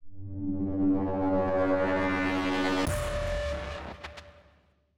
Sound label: Block Woosh Stereo; libnyquist